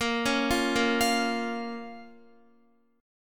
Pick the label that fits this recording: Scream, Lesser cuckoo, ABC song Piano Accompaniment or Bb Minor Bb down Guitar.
Bb Minor Bb down Guitar